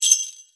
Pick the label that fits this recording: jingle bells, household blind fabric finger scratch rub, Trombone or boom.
jingle bells